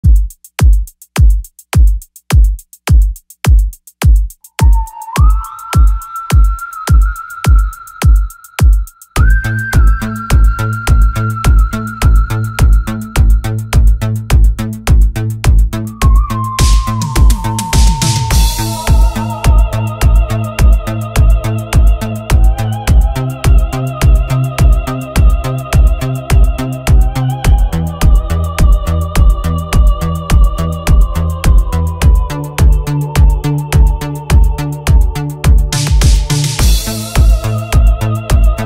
korg style instrumental, italo disco

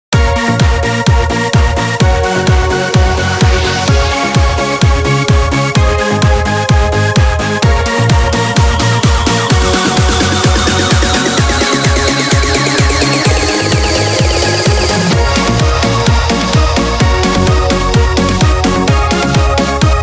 shuffle dance remix eurodance

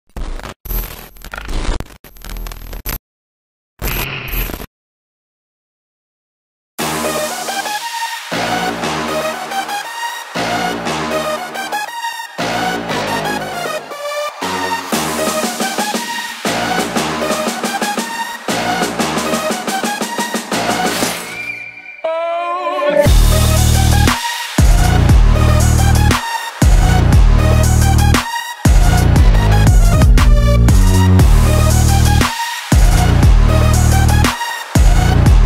karaoke instrumental

